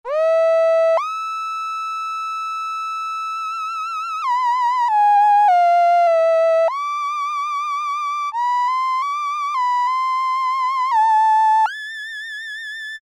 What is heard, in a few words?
soprano, Korg M-500; micro-preset